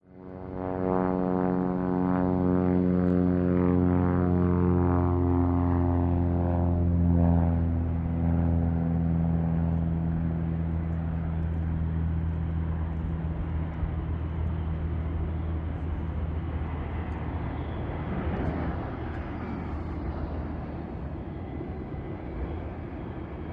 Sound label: Tarmac Ambience Dist